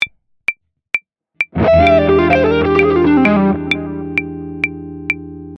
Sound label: Lick